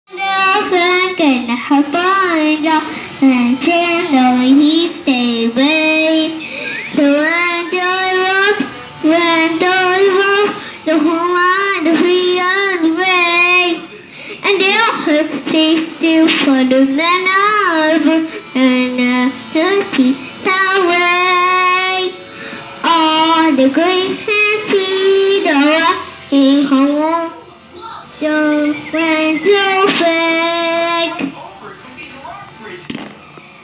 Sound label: Sung